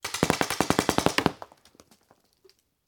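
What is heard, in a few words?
Paintball Gun Impact; Sound Ideas; Guns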